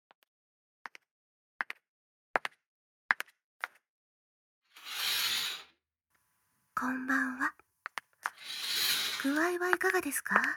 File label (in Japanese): キス、耳舐め; 夜間看護